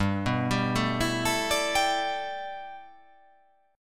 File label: G 6th Add 9th G, chord